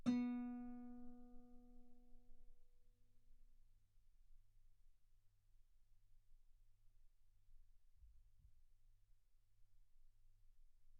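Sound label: Guitar ff B string B3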